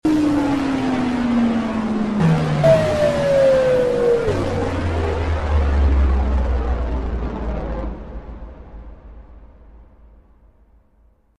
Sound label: G2 Agro FX